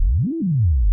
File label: 092 R&B Bass Slide D#, Synthbass; R&B Funk Soul